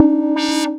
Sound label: Lead, 8-Bit